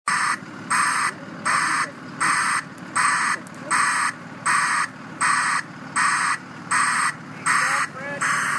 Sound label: alarm